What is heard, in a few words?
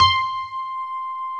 PF C 5 -L, GRAND PF